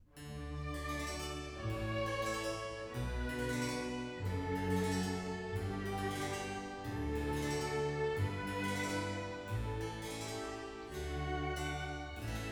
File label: canon in D; pachabel